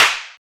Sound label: hand clap sample E key